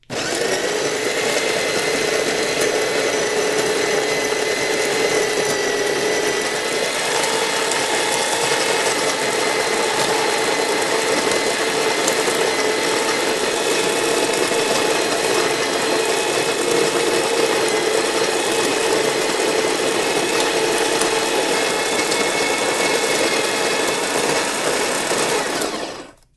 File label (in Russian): kofemol